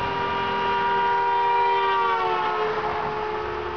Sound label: doppler; formula1